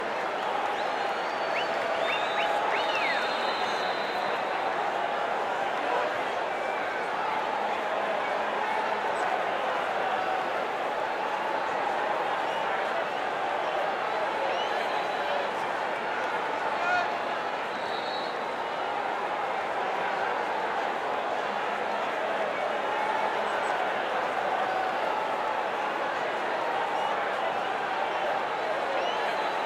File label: ambience fight, battle crowds